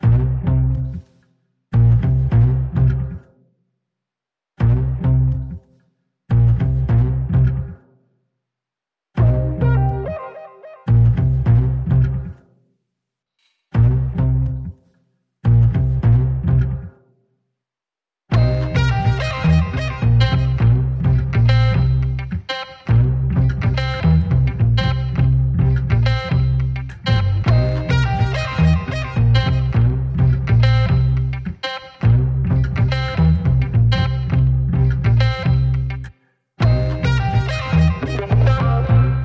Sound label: Guitar and Bass Only